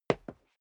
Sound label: step sneaker concrete single stair down; schritte